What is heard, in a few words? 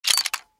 Scroll Case